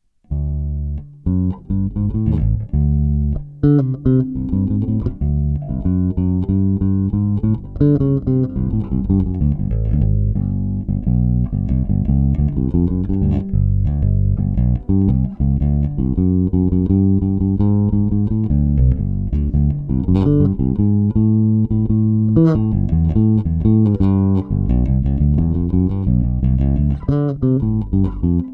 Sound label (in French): basse parallele; prise directe; basse bleue